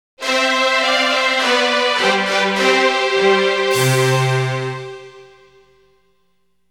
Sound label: Game Over Bright Version; Goketsuji Ichizoku 2; Power Instinct 2; playstation; ps1